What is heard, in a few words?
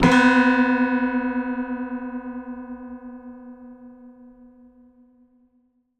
upright piano fx musical texture note creepy b; fvtt sonniss gdc audio